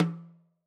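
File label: Son Snare Off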